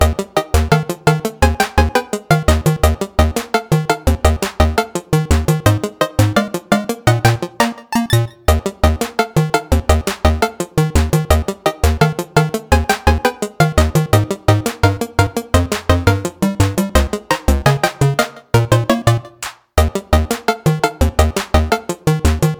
Stage select Loop; invercity

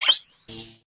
Detect Anomaly; Patcher Detect Anomaly

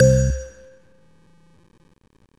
Train

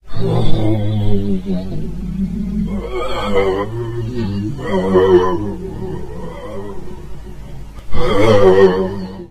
walk; guns n zombies 2014 pc; Golovan

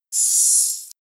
SYRINGE SOUND

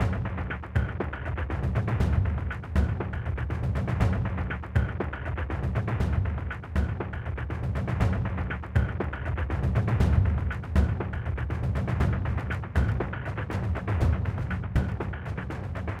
Music Gameplay State Neutral; Sifu; H3 Level Area=Flood Corridor

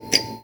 pinball bell

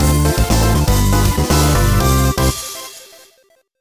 Clear Jingle

